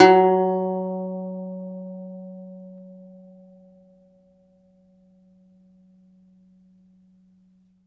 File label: DOMRA, Segundo Domra, Plucked Strings